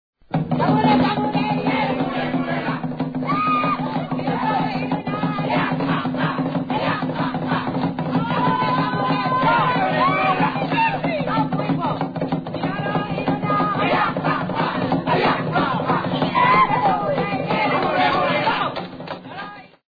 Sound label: Native Dance; Miss Sadie Thompson